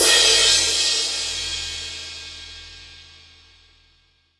big room drum crash sample e key, Royality free crash cymbal drum sound tuned to the E note